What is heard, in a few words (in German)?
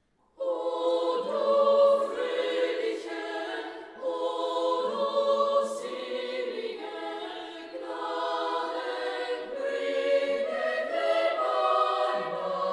O du Fröhliche (Arr. for Choir); Sacred Choral Music